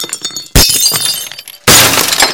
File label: Glass